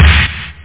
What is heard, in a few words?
delay snare